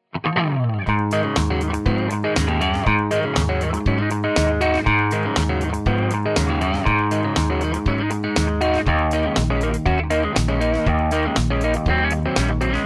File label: crunch bridge neck, Tele; schwarzbach; Tele60s